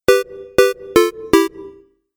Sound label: Retro Game Melody; Video Game Sound Effects